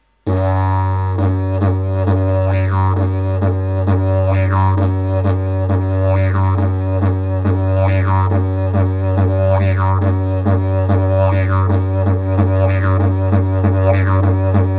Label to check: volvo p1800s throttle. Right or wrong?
Wrong